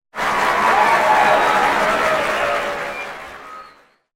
Crowd Cheer; Soundzabound Volume 3

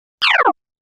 Lazer Shot; Soundzabound

